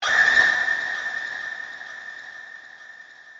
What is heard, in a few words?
scream; kshmr